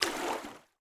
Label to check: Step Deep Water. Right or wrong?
Right